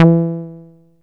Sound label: SOFT E3; Best Service Alpha Dance vol.2; SOFT SEQ.2